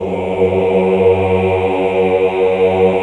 CHO, Roland

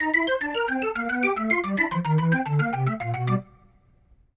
Rotor Riff, Organ; Sonic Foundry (Sony Creative Software); Joe Vitale Organ Donor

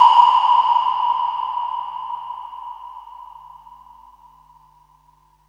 FX, Drum & Bass

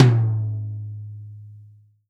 tom, Real Drum Kit, funk kit

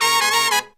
Horns, 96BPM; Phantom Horns; 90 s Sample CDs; Zero-G

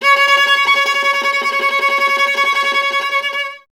viola C#; Miroslav Vitous; Solo Instruments VOL-1

